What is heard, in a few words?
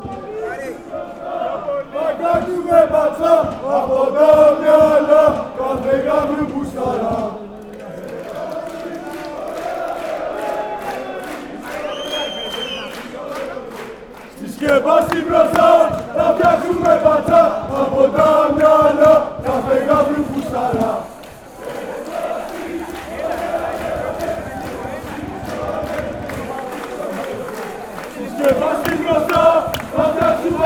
aek athens chant